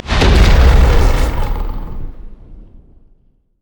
battle roar; rengar

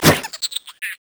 jack in the box; jester

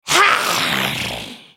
spellcast effort; Cassiopeia; Character Voices - English

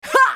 spell cast effort; Katarina; Character Voices - English

